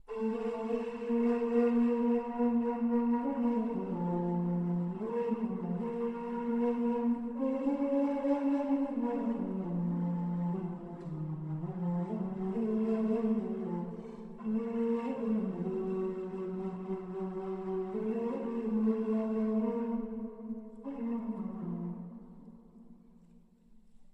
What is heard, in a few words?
ney